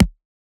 Perc, Percussion; FLPRO

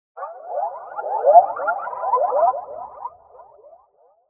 Windows XP Startup Sound in Birds

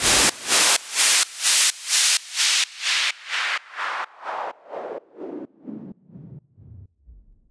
Fall Side Chained

